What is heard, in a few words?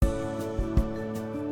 wand Trans